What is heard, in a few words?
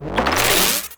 Amp Reload Ready; Operators; Amplifiers; Drifter; Warframe